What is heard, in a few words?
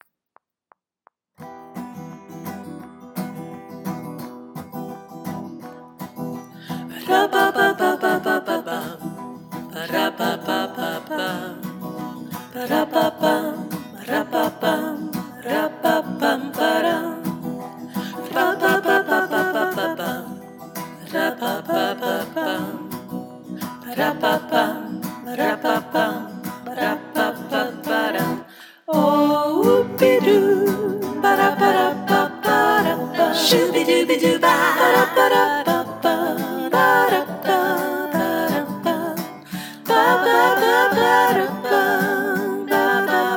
tutti